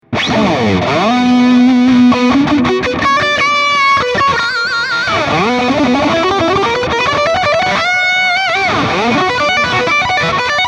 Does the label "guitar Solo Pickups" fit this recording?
Yes